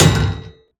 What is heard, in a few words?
Exo Hit